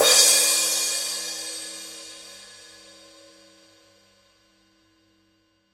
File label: 16 medium edge, paiste 2002